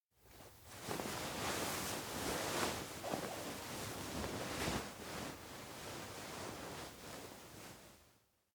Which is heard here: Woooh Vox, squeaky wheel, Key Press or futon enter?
futon enter